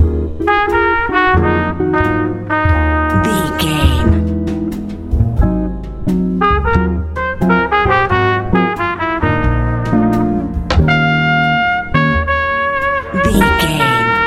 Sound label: club jazz